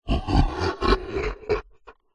horror monster creature growling evil laugh

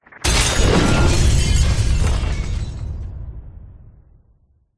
explosion boom medium heavy breaking glass

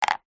container small plastic empty down on wood

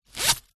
foley purse pouch leather coins inside zipper

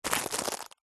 foley packet plastic cat ball bell pick up; zapsplat

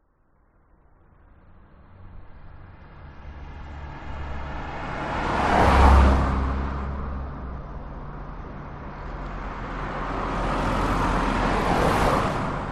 transport traffic cars pass by

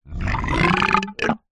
horror evil demonic growl

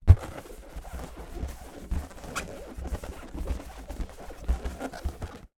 foley balloon inflated handling

